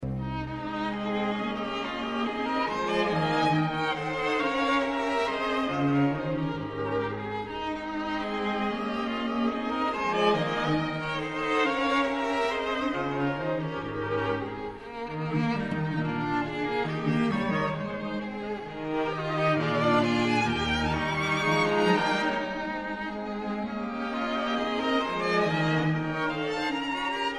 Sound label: string quartet c minor; beethoven; op18 no4